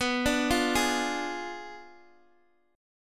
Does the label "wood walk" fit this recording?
No